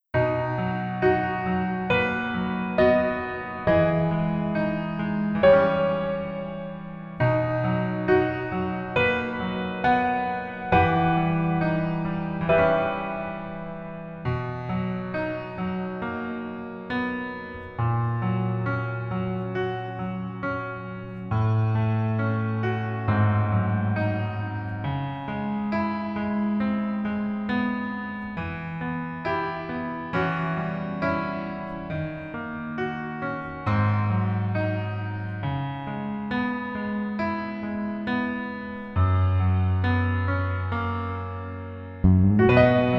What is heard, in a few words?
Piano ver